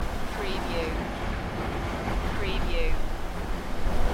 Skiing, Snowboarding, onboard perspective, very smooth going (Loop)